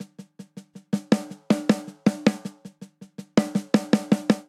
Straight Six Rock Snare Only 6/8 80 bpm; Ableton; Drum Booth

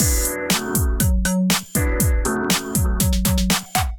groove 120 bpm; Sensor Kit

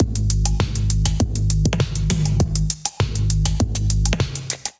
Brand New Beats Kit groove 100 bpm; MIDI Clips; Drive and Glow; Ableton; Factory Packs

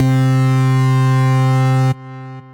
Synth Lead

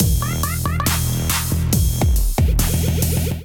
kit groove 139 bpm; Riot Kit

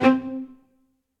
Short All-in-one - String Quartet; Instruments; String Quartet by Spitfire Audio

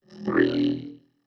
Neo-Wave Vocoder, Pop Rock

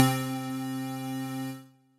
Synth Lead

